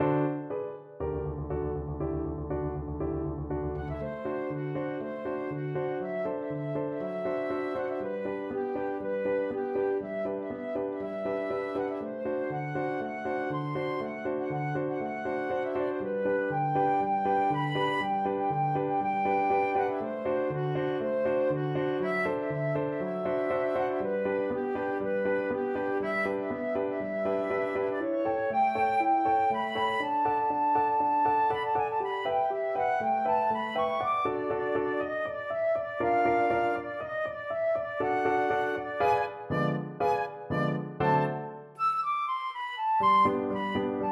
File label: thunder, flute